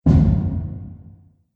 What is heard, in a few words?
Impact Hall; Miscellaneous Impact